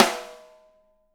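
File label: 6X12 ALUMINUM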